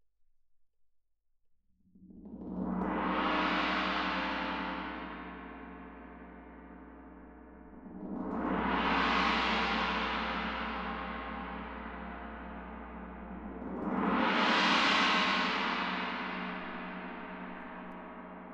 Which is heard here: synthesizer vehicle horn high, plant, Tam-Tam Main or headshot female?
Tam-Tam Main